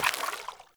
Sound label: Grunt Step Water, footsteps; Enemy; Corrupted Sproutfolk